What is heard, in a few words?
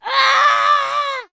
Child Scream; Don't Be Afraid The First Toy